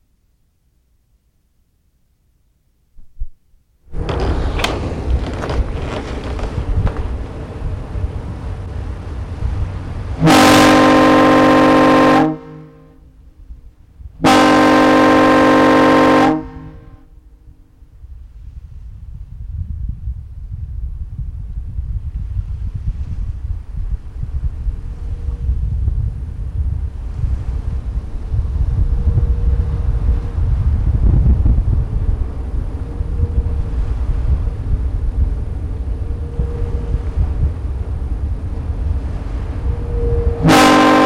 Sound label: foghorn; restoration